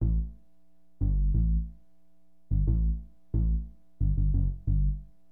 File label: BASS, HipHop; LiveAct Pool